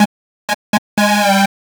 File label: Synth, Trip-Hop Loops; Sonic Foundry (Sony Creative Software); Rondo Brothers Trip-Hop Loops & Samples